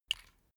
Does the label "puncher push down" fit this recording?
Yes